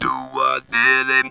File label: Talk Box; E-MU Producer Series Vol. 8 – Platinum Phatt